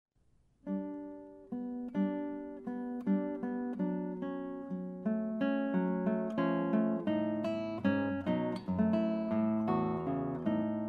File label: Duo, chamber; Hamilton